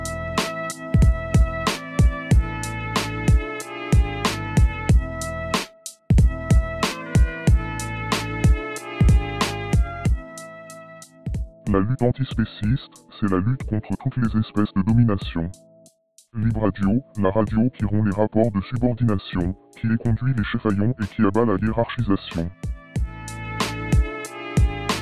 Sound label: Jingle Morsures